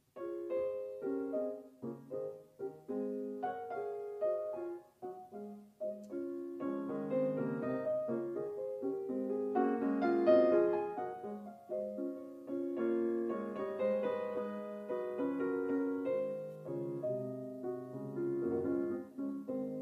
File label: Beethoven Piano Sonata 14